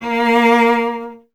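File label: strings cello A#; Miroslav Vitous; Solo Instruments VOL-1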